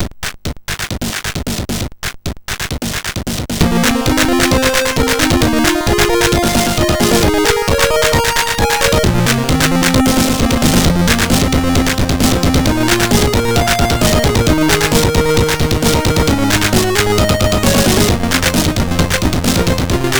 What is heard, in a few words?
MOS6581R4, sid